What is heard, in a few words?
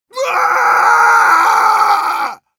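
Engineer pain critical death, ru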